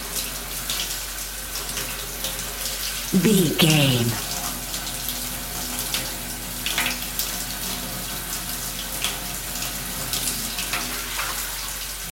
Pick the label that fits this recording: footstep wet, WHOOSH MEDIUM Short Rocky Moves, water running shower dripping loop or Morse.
water running shower dripping loop